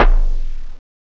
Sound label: SYM KICK; DRUMS; CMI Sound Library Rev 1.3